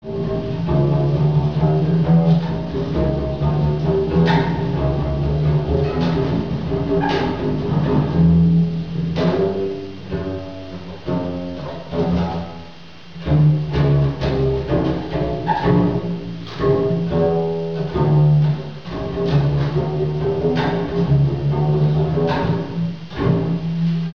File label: BASS